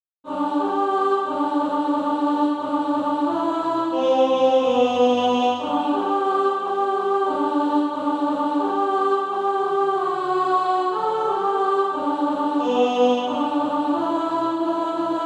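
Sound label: alto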